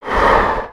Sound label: Breath, creatures organic; Bruno